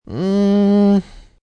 Hmm Dont Know, male; Reaction

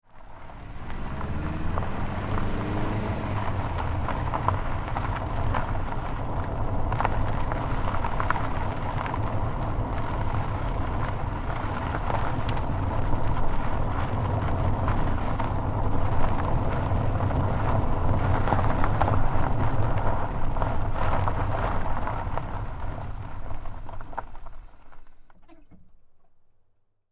Car, Tire, Onboard, Gravel-Dirt, Roll, Med; Cars (Sound Effects:Vehicles); Big Room Sound

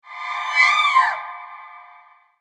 Scream Trapped In The Mirror, screaming

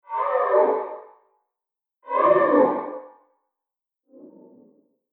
Crazed Monster; Monster Sound Effects; Fantasy Sound Effect; Andrew Fleming

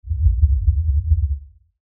Lfe Rumble By Swell Low Bass Movement; Blastwave; Sound Design

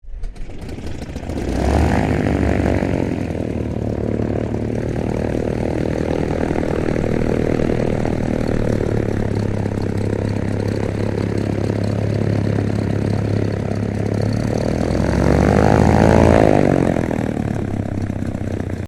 AIRCRAFT PL-12 AIRTRUCK STARTUP IDLE; 1965; Airplanes; Vehicles

